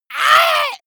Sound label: monster painful cry high